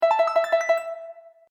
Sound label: Bright Sound Video Game; Twistedtracks